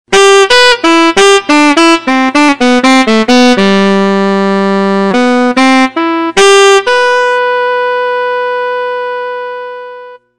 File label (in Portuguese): Saxofone